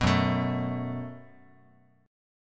Gb Minor Double Flat 5th, Guitar; Gbmbb5 strummed

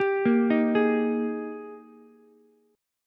G sharp Major 7th Suspended 2nd, Ukulele; arpeggiated; chord

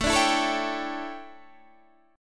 D Major 7th Suspended 2nd Suspended 4th, chord

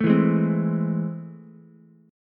A Minor Major 7th, down, Ukulele; strummed; AmM7; JGuitar

